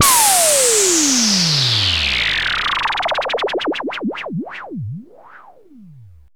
NOISE FALL; Zero-G Ambient 1; NOISEFALLS

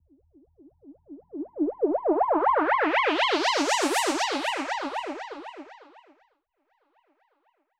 Filter Proc A, alarm siren samples, 120bpm; musicradar

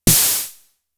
Snare, Drum Machines; Fricke Schlagzwerg

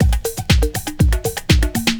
120 HOUSE, DRUMS

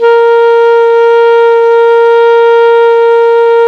ALTO PP A#3, SAX; Greytsounds - Philip Wolfe David Crozier's Saxophones

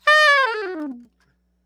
SOP SHRT D 5, saxophones, SHORT FALLS; Greytsounds - Philip Wolfe David Crozier's Saxophones

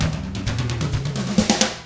127 Drums

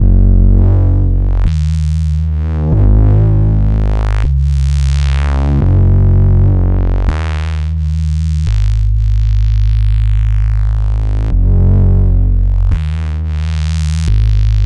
Mod Bass, Drum n Bass; KJ Sawka; Drum n Bass & Beyond

